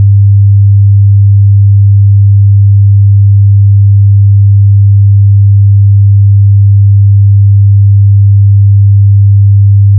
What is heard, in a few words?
100hz